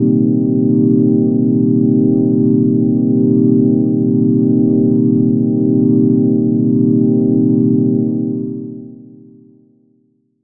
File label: pad transcendent; Pads